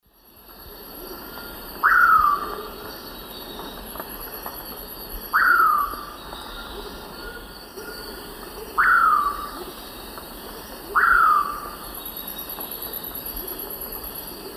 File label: Nyctiphrynus ocellatus, song; S. America; Eastern Lowlands